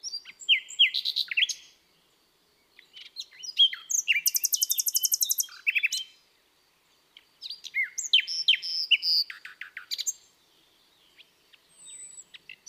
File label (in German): vogelgezwitscher